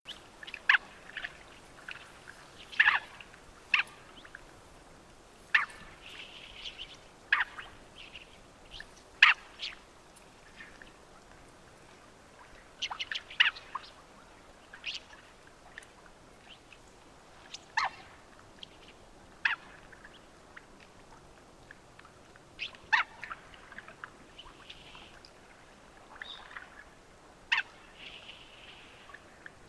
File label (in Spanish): pato mandarín Aix galericulata